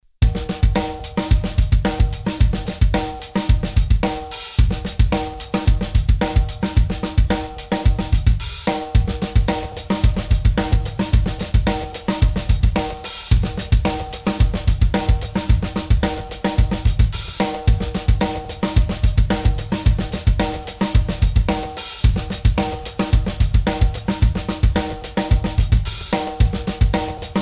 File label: Drum Loop